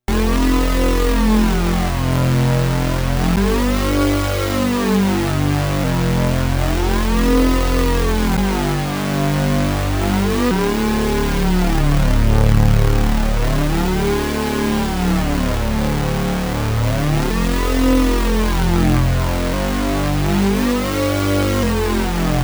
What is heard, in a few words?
OBX Preset